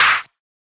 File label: Clap